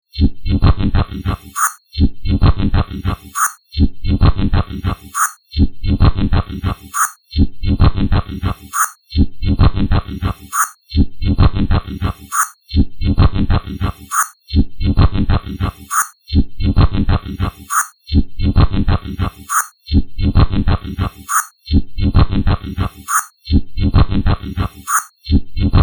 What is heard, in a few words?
Telemetry Blips Alien, Signal, Science Fiction, Loop; Telemetry, Blips, Alien, Signal, Science Fiction, Loop, 5.1